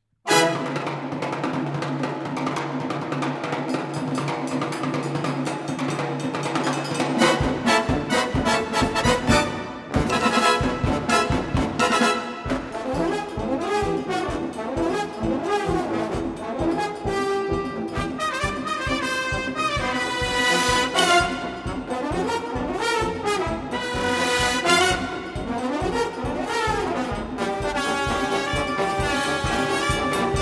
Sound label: brass